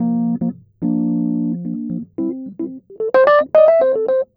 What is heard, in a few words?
E. Piano